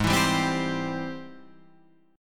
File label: Ab Augmented Major 7th Ab Guitar Drop D